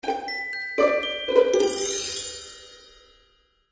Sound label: Magical Music Finale, Cartoon Music Sound Effects